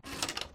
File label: door open